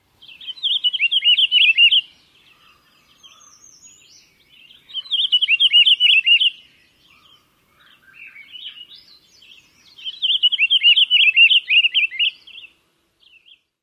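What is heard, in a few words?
song, Pine Grosbeak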